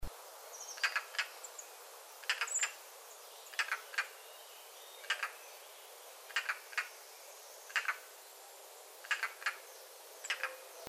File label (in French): rollier d europe, chant